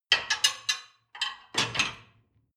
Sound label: dishwasher plate place